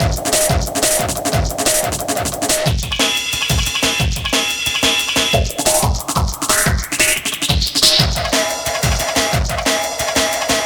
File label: Victory Beat, Drum & Bass; Fluid Dynamics